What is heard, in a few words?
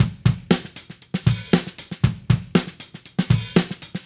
Drums, 70s Analog Funk; Sonic Foundry (Sony Creative Software); What it Is!; Good Times